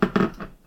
putting metal bottle

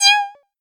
cat like

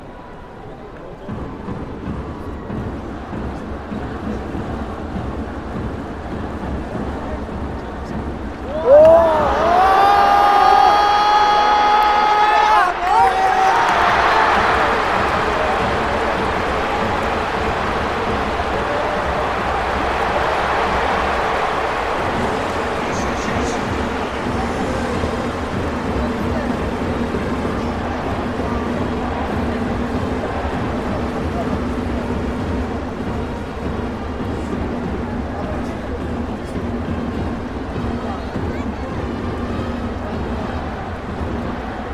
baseball stadium

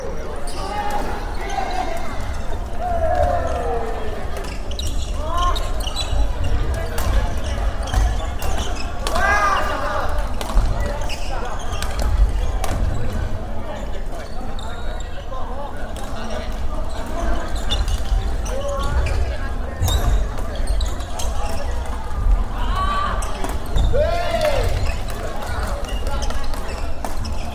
badminton

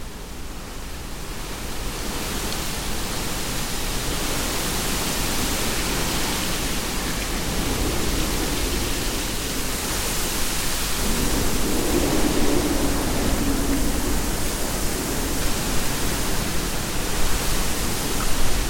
wind and trees; nature

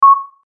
button

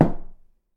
knock on house wall; knocking

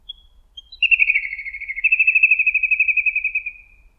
little grebe; animals

